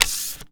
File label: Key Card Pickup; zps